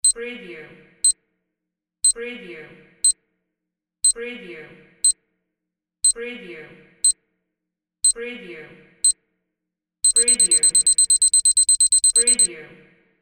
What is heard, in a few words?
timer countdown